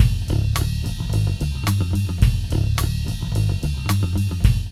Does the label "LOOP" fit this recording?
Yes